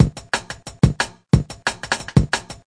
179 bpm basic tinny, drum loop

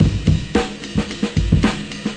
110 lofi , drum loops and breaks; 101 to 110 bpm

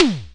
Simmons SD-5 drum machine

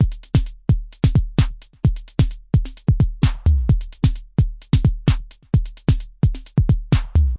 analog, drum loops and breaks; 121 to 130 bpm; soundsonic nks analog berlin

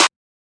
Hot Clap; drums; hip hop; STYLE KITS (Hip Hop, Etc); Khezie Hip Hop Kit

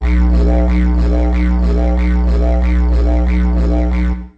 didgeridoo; World Music

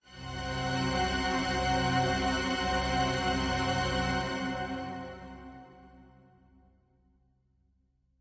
Heavy Strings C; PADS and STRINGS

